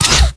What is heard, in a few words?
arm joint airpunch